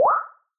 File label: Retro Blop